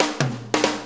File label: Shuffle Fill, Drum Tools